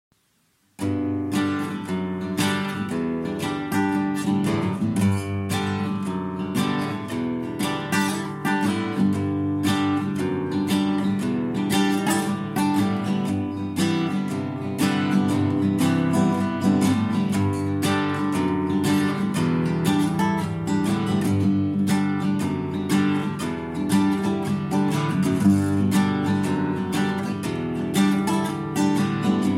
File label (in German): Gitarre